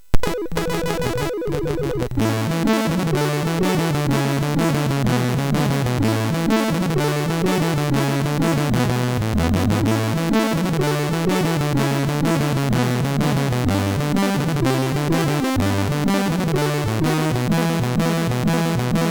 sid, MOS6581R3; Hook; hvsc

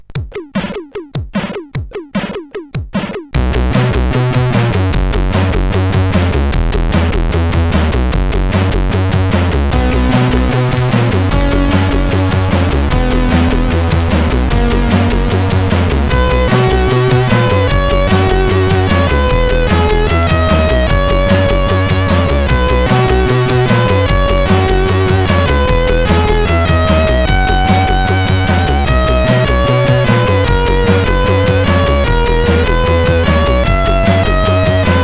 RTI Intro, CSG8580R5

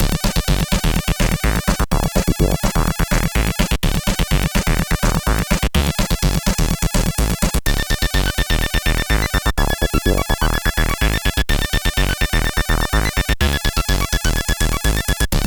Intro sid CSG8580R5

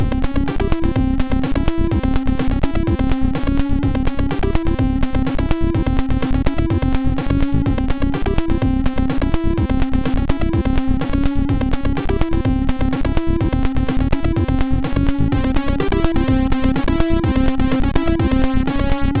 Goatic, MOS6581R4

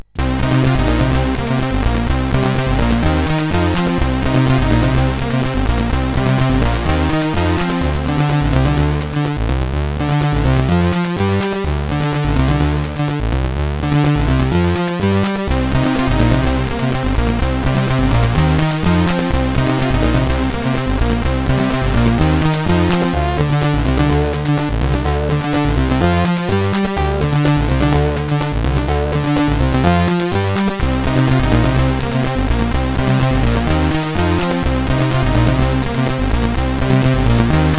Beat Me, sid; CSG8580R5

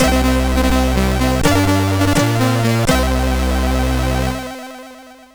Metal Warrior 2, CSG8580R5

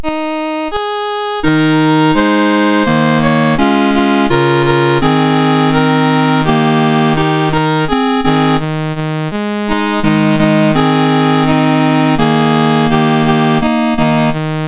Swaziland, National Anthems, MOS6581R4; Dave Marquis

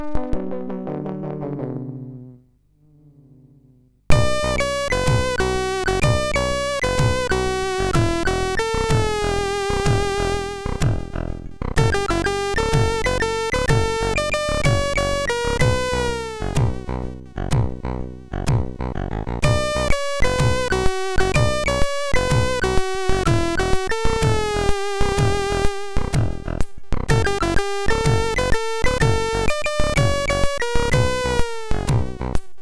Blues, sid MOS6581R2

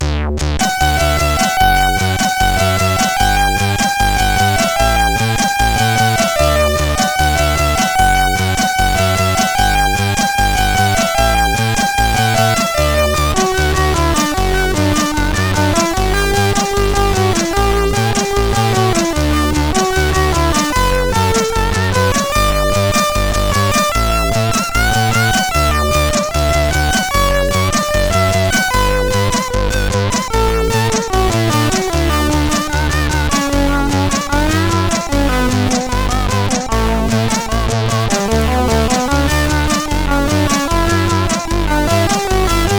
Rock, CSG8580R5

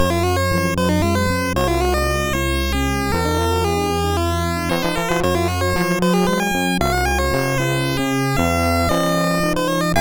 sid MOS 6581 R4

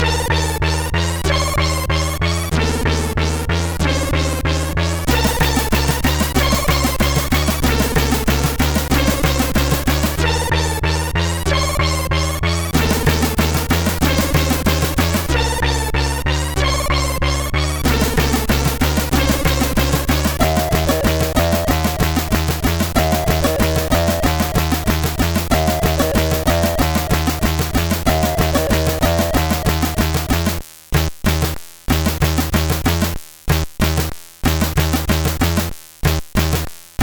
Fly sid CSG8580R5

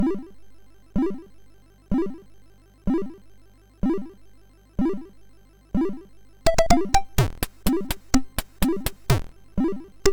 sid, MOS6581R3

